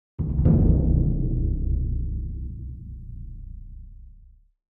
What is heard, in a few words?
Action Transition, Classic Horror Sci-Fi Music; Dead Ark; Action 3